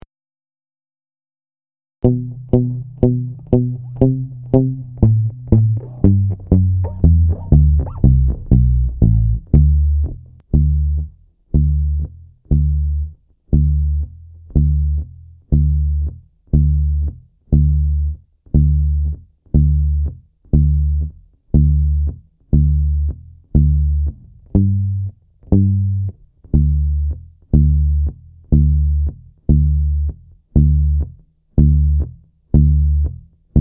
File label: cello